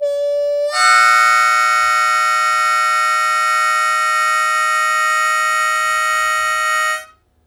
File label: Blues Chord Attack Ab1, Harmonica; Zero G - Harmonica